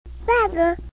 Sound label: bubble